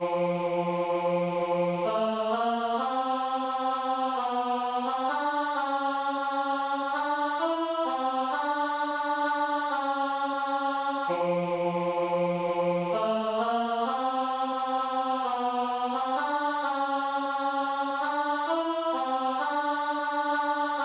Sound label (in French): tenor; la nuit est deja la veillez et priez